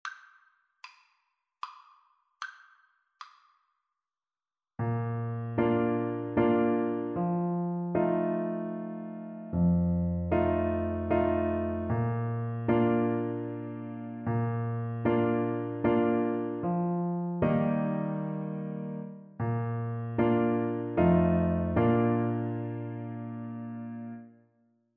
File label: Tenor Saxophone